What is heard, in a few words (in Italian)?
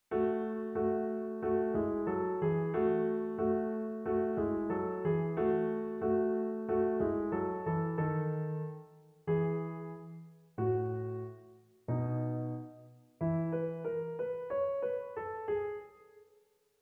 Adagio